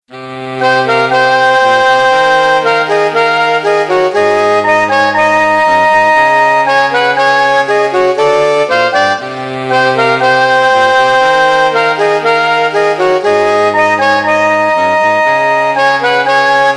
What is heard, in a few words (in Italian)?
Intro & Fuga (Quartetto Sax)